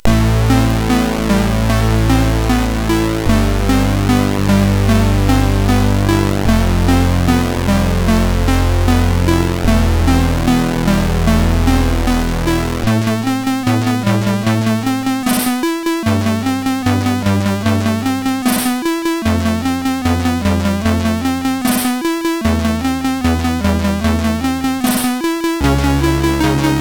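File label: On a Move, MOS 6581 SID chip music, games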